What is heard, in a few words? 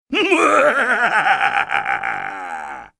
Evil Laugh